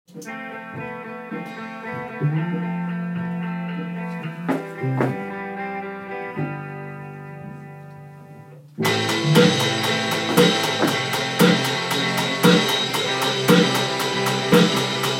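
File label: 4 4 rock recording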